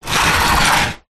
household wooden furniture cabinet small slide tile floor